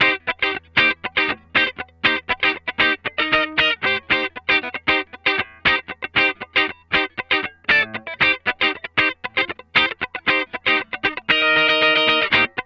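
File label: 117 Cm DISCO guitar, 117 BPM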